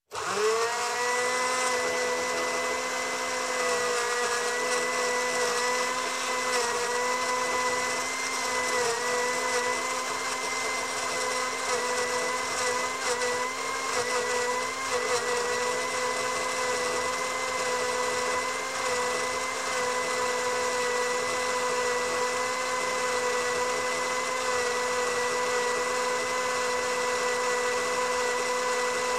Blender, Electric Start, Run, Stop, Dry Ingredients; Sound Ideas 2000 Series